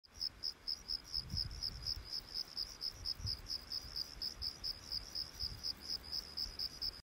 Crickets

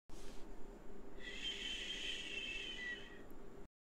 Creepy Shhh; disturbing human

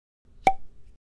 Cork Pop, wine bottles

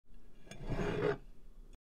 Mug sliding across table

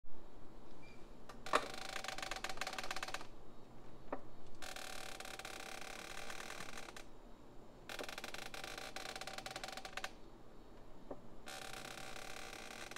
Creepy rocking chair, haunted house

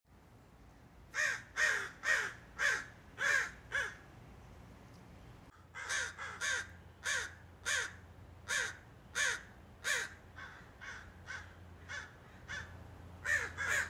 Crows Cawing

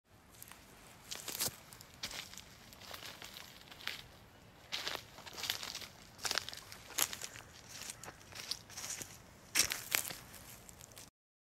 Stepping on Twigs Leaves; walking

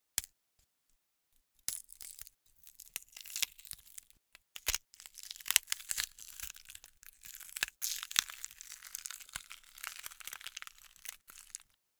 egg hatching

